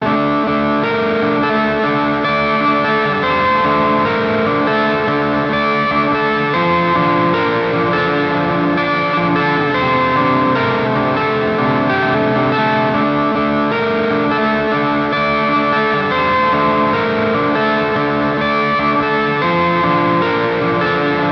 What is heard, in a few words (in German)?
Zerrpedal Delay Reverb; Audiovergleich Mooer Nux